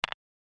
Stone Landing; my cute commissar 2020 gamerip

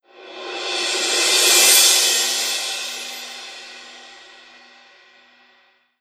Soft Cymbal; gamerip; Skylanders 3 Swap Force

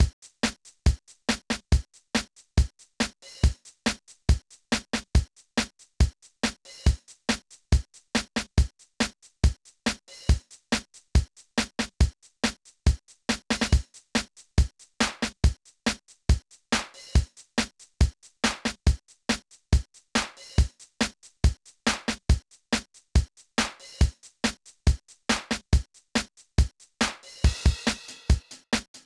Music Karaoke; asterix these romans are crazy 2009 nds gamerip

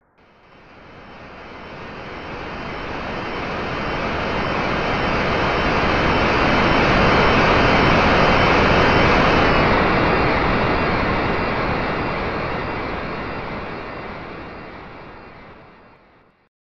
airplane; Super Family Tennis; SNES

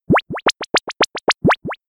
Metronome

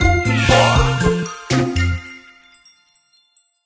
Cakestack Jingle Cherry; My Tamagotchi Forever; android

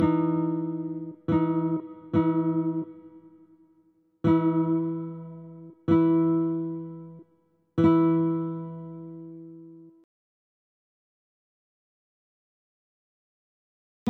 Playing Chords; betrayal at krondor

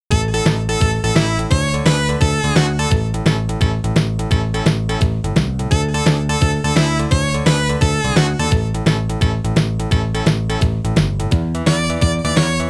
Loading Blues; Drinky and Smokey; x68000

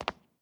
concrete footstep; adams venture origins

